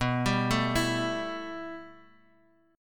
B 7th Suspended 4th Sharp 5th B down Guitar Drop D